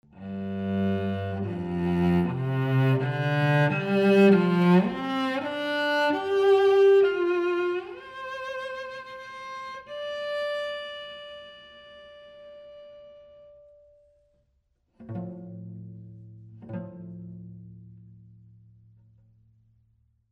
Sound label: Cello, Small Chamber; Bricasti